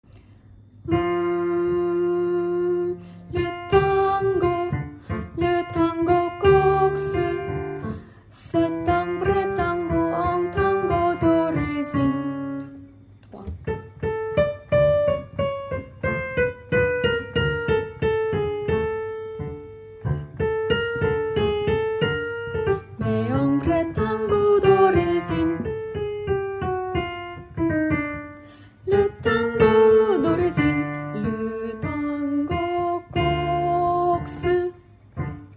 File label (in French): le tango alto et basse, Le tango Corse